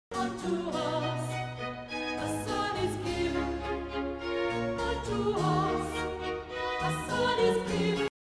motivo

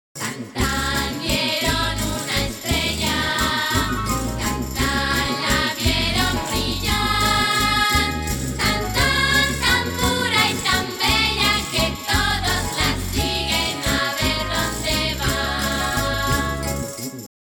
estrofa; forma estrofica

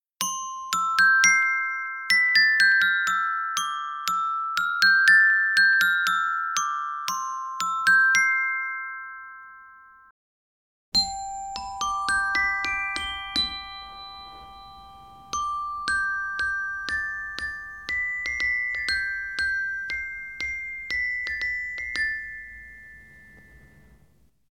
Sonido de glockenspiel; Instrumentos de percusion; Percusion determinada; laminas

